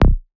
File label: C2 Bass